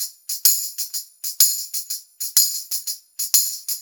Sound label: Blues Perc Loop; Drum Tools